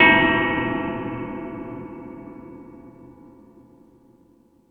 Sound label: Jungel Percussion, E-MU Pro-Cussion; drum machine